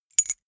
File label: key ring small place hard